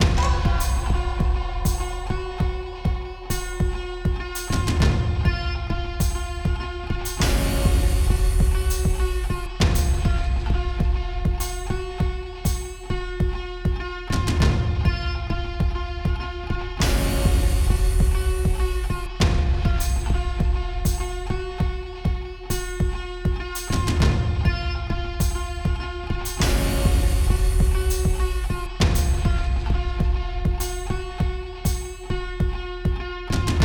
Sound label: Music Tension; Sifu; H1 Level Area=SkullBro